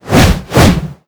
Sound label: Mastodons Attack; Seer; GamigoNA